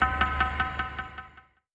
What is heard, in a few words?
echoey ninja, Stabs